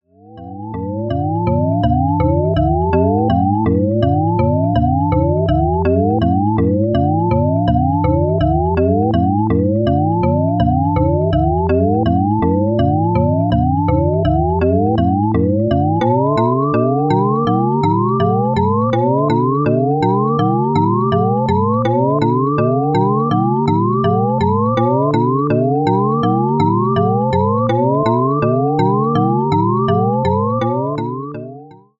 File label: Key Sequence pitch opposite; Sound Particles SkyDust 3D